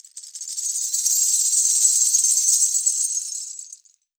wrist, world percussion; World Instruments 2

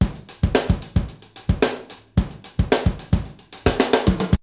Drumloop; ZERO G - Datafile DISC 3

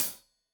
Hat Tight, Hi-Hat, Cymbals; Deeply Sampled Drums

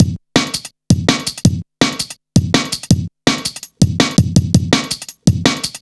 165, Drum Loops, Drum & Bass; Total Drum&Bass

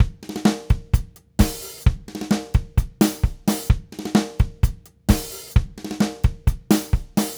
Tango, Latin Percussion, Drumset; Sonic Foundry (Sony Creative Software); Joe Vitale Latin Percussion

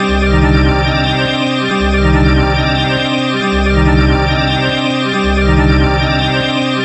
NRG Pad

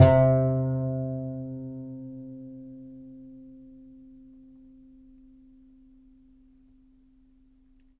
C. Bass Balalaika, Plucked Strings

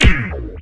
STAB

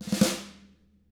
TAMA RUFF, snare rolls; ILIO; Double Platinum Drums 1

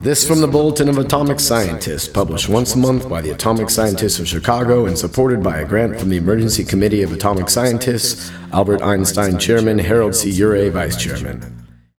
Vocal Sample; Sonic Foundry; Rondo Brothers Trip-Hop Loops & Samples; Apologists

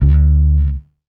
Electric Bass; Sonic Foundry (Sony Creative Software); Knutrix Drum & Bass

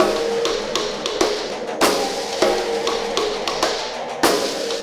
Ripple Perc

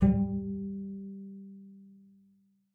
G3, bass pizz; Xsample VOL-5

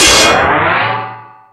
FX Sounds, Drum Machines E-MU Pro-Cussion

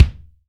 LA KICK; AKAI S6000 CD-ROM - Volume 3

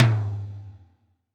Tom Mid Clr Wet, Alesis D4

